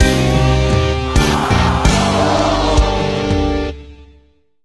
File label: Lose; Entaz Baseball 2011; Android